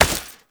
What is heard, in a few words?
Step Dirt, Footsteps